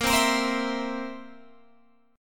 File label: Bb Suspended 2nd Flat 5th Bb down Guitar Open F; strummed